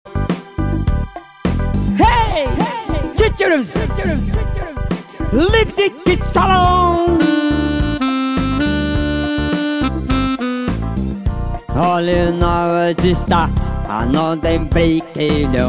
baiano cantando

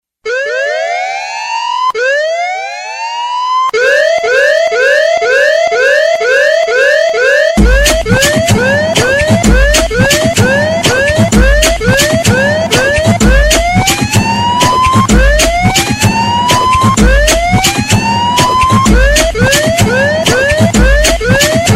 sirene rmx

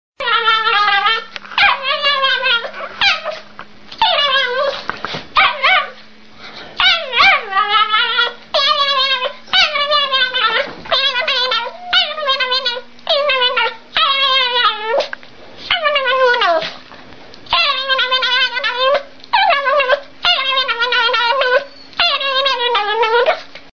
cachorro maluco rosnando

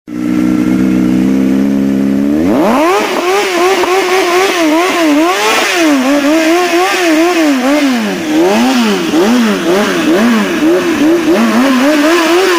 hornet honda moto

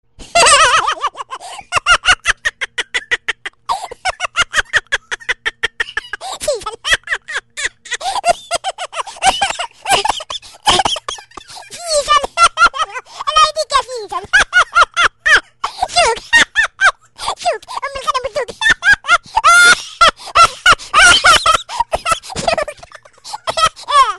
risada sem nocao

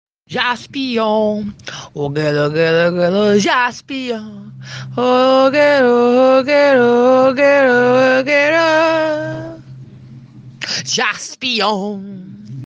cantando a musica

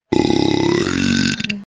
arroto; oi

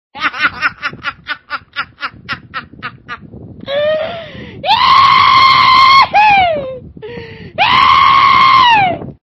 risadinha empolgada

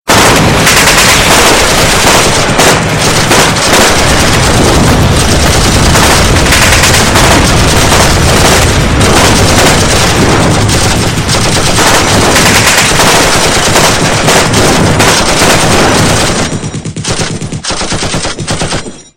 tiroteio intenso

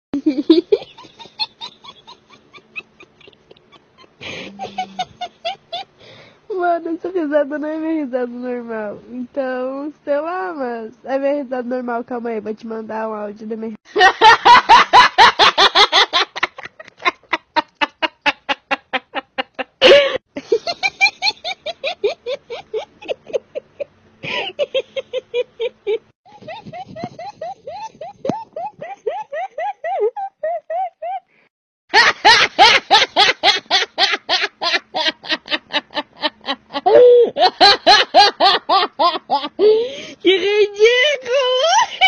risada; ouve a minha risada normal

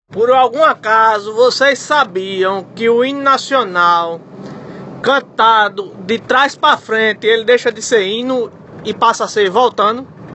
Hino nacional

